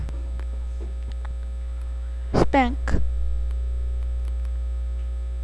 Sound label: spank